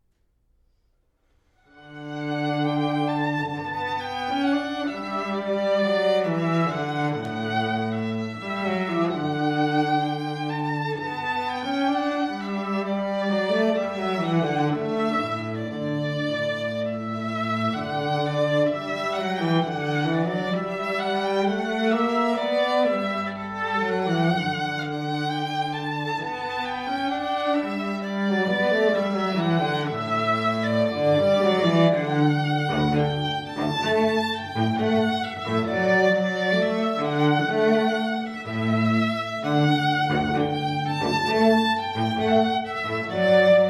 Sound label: Violin Cello